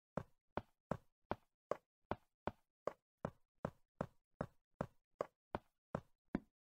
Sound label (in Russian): shagi po zolotu minecraft; zvuki hodbi